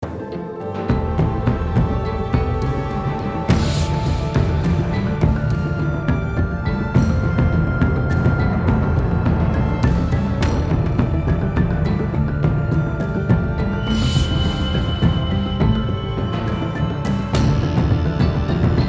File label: Action (Loop)